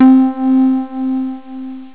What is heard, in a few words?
beep; xfce